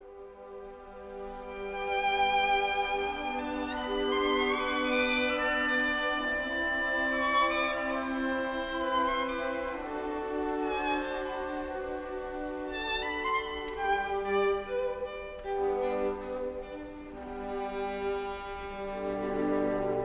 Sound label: Violin; Beethoven Violin 1; New York Philharmonic; Bernstein